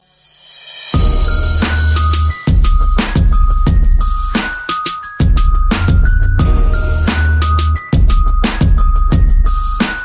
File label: gangsta beat; hip hop